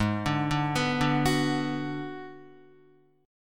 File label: Ab Minor 7th Flat 5th Ab, down, Guitar, 4F, 4A, 5C, 5G, 5C, 6E; arpeggiated; JGuitar